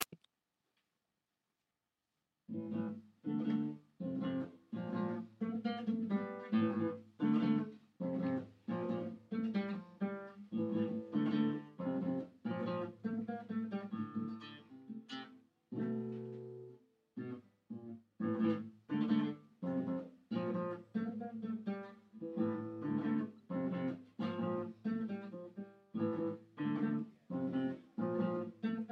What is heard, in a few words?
guitar solo